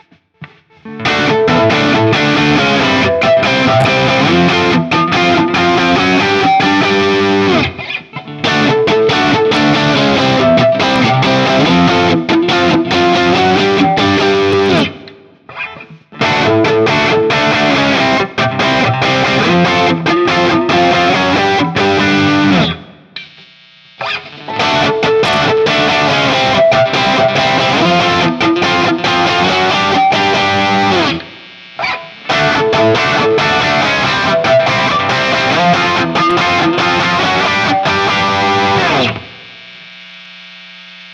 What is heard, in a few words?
Guild Liberator Elite JCM800 Preamped Single Coils; 1988 Guild Liberator Elite